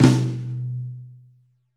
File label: Pop Flam Tom, Roland BK-5